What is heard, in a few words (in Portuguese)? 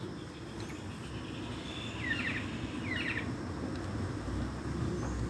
chororo, Choró-boi (Taraba major)